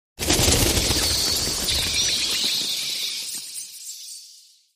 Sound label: open world tree